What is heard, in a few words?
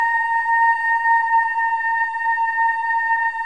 BOYS EES, Symphony of Voices; Spectrasonics